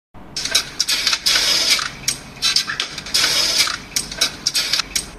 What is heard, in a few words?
voice of leaking refrigerator